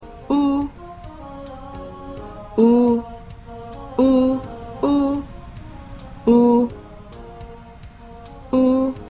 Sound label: Ensemble Tenor